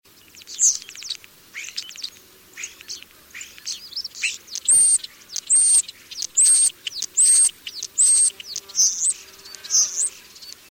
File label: European Starling